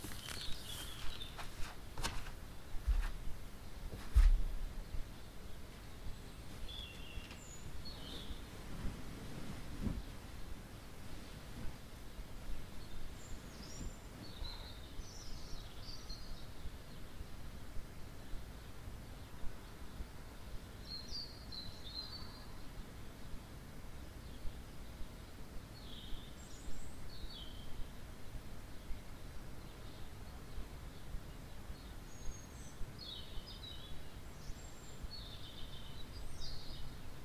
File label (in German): vogelsang